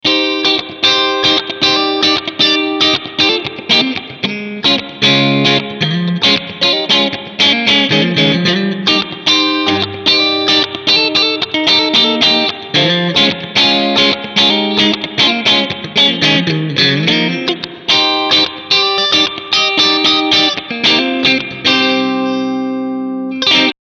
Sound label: Clean Strumming Position IV